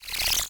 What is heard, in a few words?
launch; World Of Goo